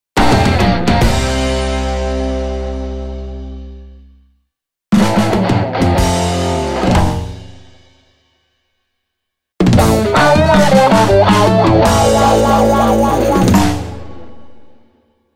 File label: JINGLE DISTORTED GUITAR